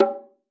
8 bongos bongo; ETHNIC and WORLD PERCUSSION; Eight Bongos

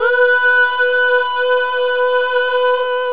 oooooooooh chorused; VOCALS and SPOKEN WORD

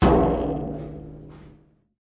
big cans, Big Rattle Cans; UNUSUAL KITS and FX

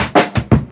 Funky BK, dirty hip hop; Dirty Hip Hop Kit; style kits

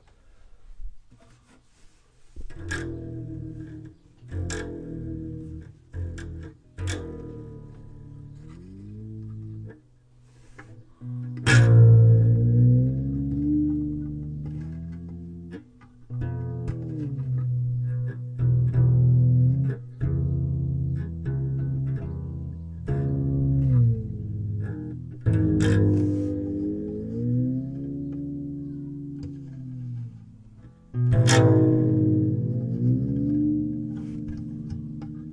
found sound guitar; UNUSUAL KITS and FX; Werkstatt Found Sound Kit

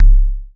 Yamaha TX-81Z BD

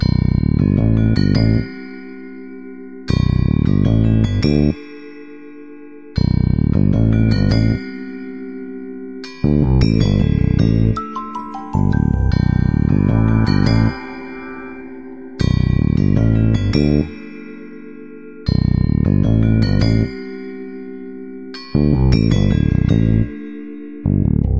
gamelan bass groove no drums; REMIXABLE COLLECTIONS

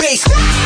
bass blast vox hit; Melodic Stabs and Hits

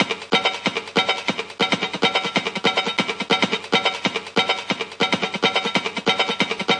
clonky, DRUM LOOPS and BREAKS, 141 bpm; what is that; 141 to 160 bpm

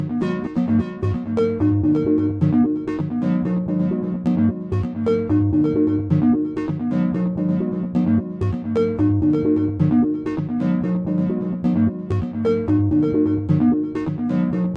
Calypso Parade, 130; stereo; melodic loops; synth and electronic loops; A Little Ant

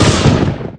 thunder hit; Weird and Interesting Hits

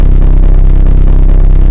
nasty ass bass; instruments single samples; basses

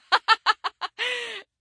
female laugh gasp; MISC and SPOKEN WORD

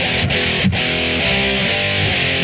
chuggs guitar; guitar loops; chuggs druggs

